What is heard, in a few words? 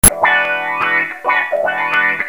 Loop